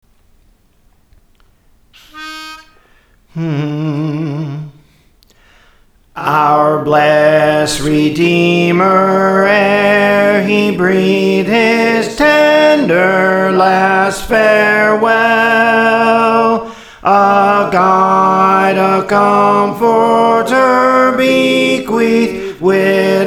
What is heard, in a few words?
Soprano; Our Blest Redeemer ere He Breathed; Praise For The Lord Training